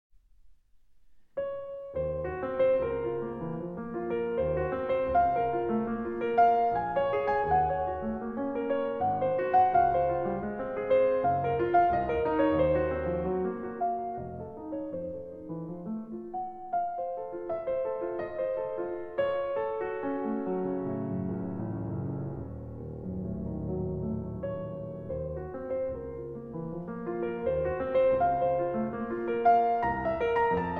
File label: Intermezzi in B-Flat Minor, Andante non troppo e con molto espressione